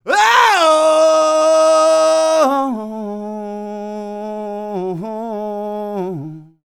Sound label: R ROCK, MALE HUMAN; ILIO; Vocal Planet